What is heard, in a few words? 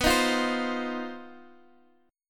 C Minor Major 7th Double Flat 5th, B, chord